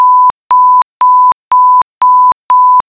low alt warning; FGUK

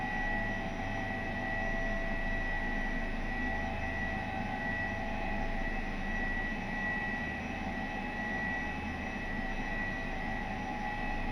H145 Flight In right, Headset; ec145

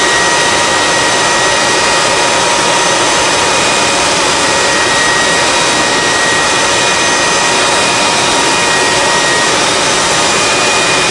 spey whine, Phantom FGR.2; FGUK

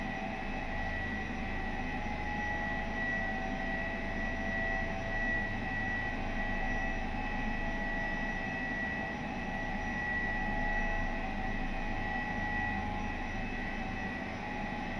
H145 Flight In right, Headset; ec145

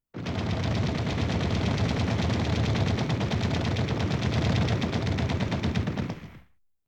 gunfire; FGUK; Gnat T1

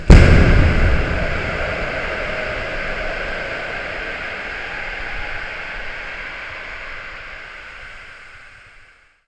inflate, ec145

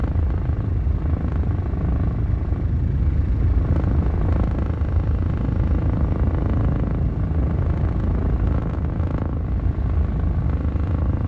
H145 Vortex In right, ec145

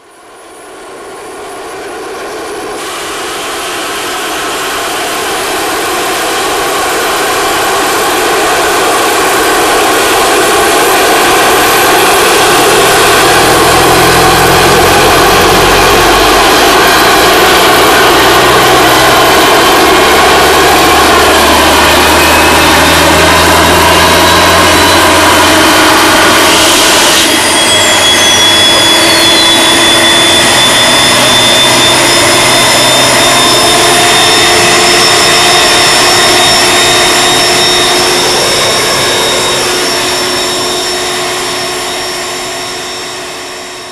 turbine start out; Gnat T1; FGUK

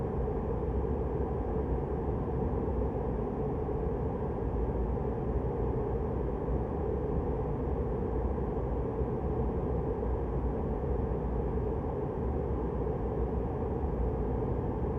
H145 Wind In left, ec145

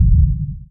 burner low freq; FGUK; Gnat T1

